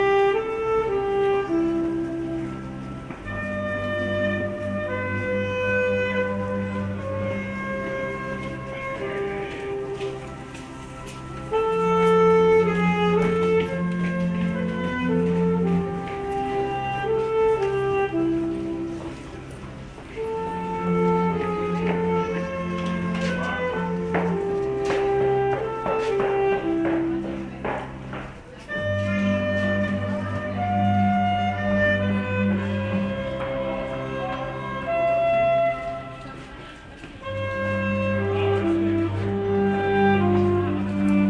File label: norreport metro med julesax